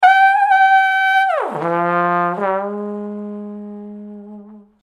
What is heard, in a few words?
Trombone